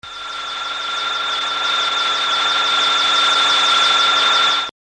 ufo fly atmos

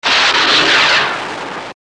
interceptor Missile

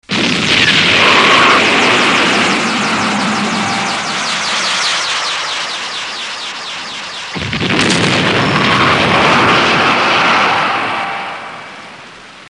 ufo hit explodes

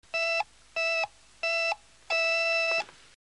hq Beeper

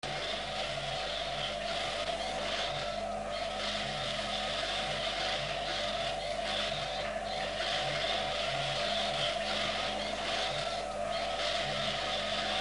skydiver Interior

